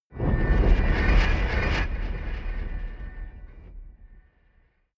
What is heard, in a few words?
reverse shards rumble, drones